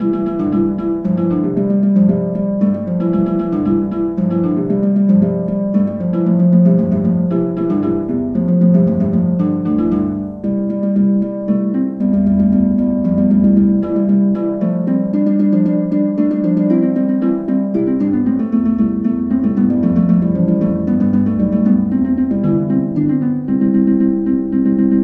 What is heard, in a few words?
Harp; The Bard's Tale Remastered Trilogy